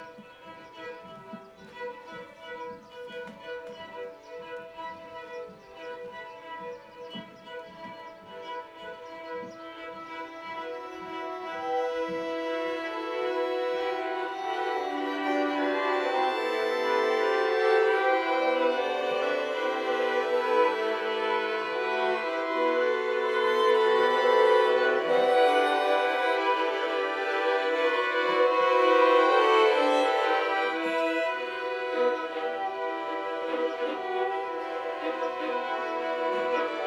violons; festival

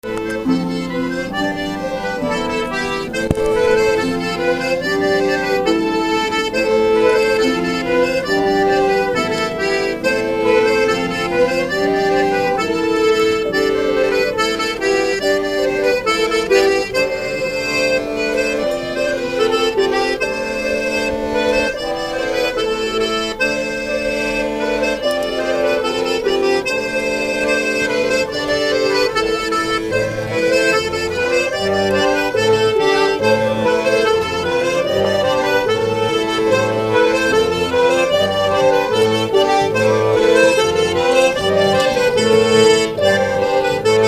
rond st-vincent; charivari

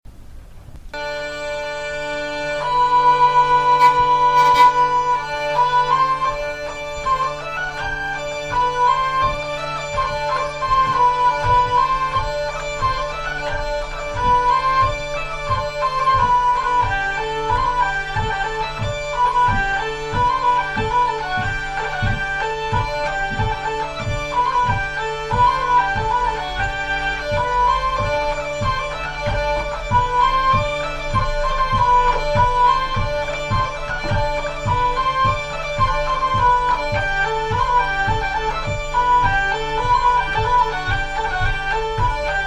avant deux melodie phrase, vielle

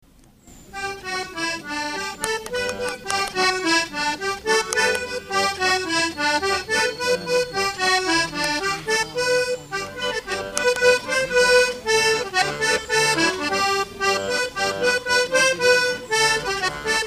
polka accordeons bombardes

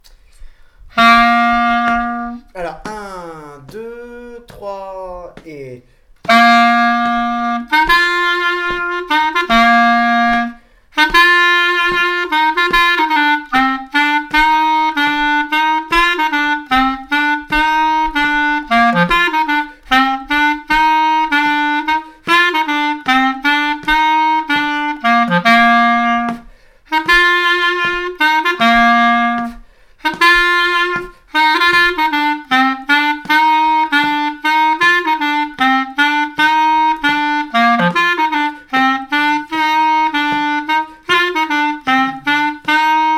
accompagnement, clarinette; au clair de la lune

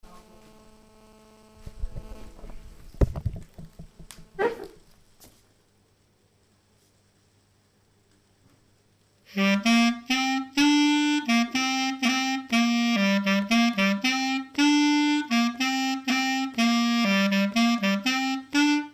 tour la plaine clar lent variation; stg clarinette

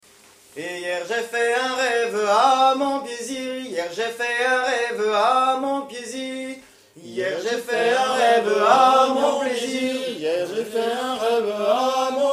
marche, clarinette

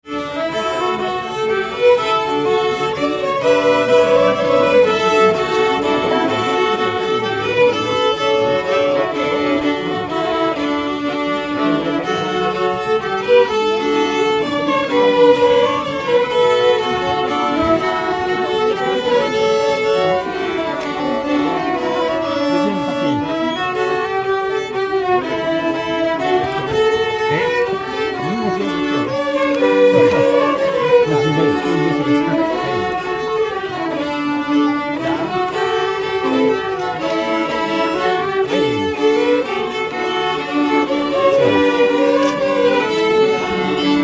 valse lente violons; charivari

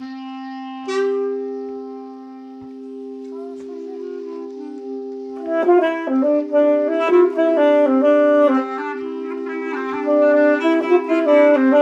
clarinette; festival

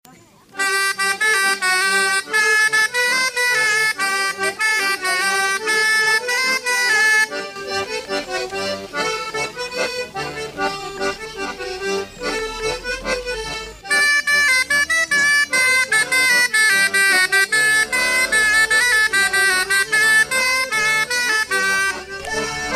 bal paludier accordeons bombardes

